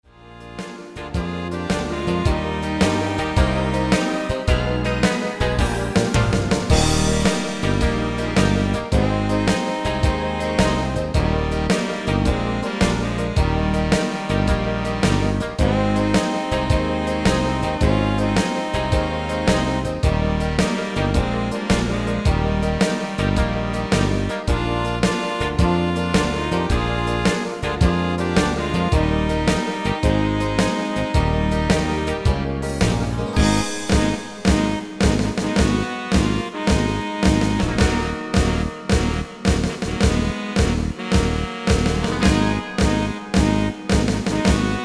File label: morgen tsax; passande för tenorsax